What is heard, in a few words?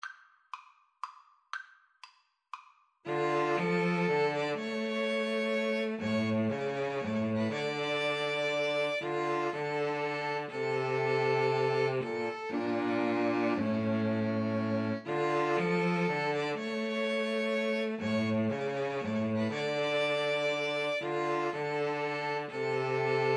pastorale, 2 Violins Cello